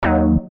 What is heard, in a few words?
MUSIC SHOT D#; Unison Artist Series Essentials